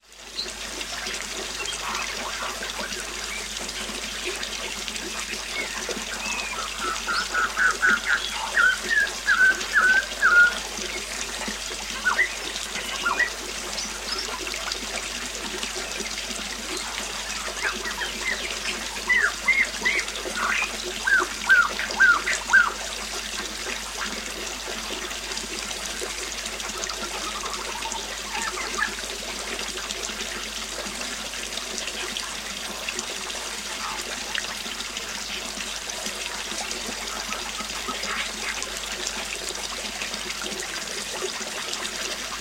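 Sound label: atmospheric sounds Bali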